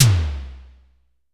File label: Drum X Tom; Pearl Drum-X